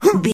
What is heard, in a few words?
voice male effort grunt